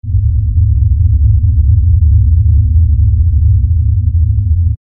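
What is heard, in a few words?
drone low frequency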